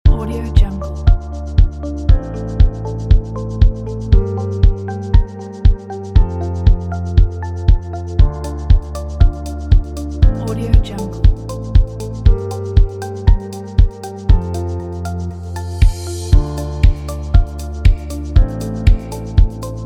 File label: chillout